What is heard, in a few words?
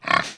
boar sniff; The Elder Scrolls III - Morrowind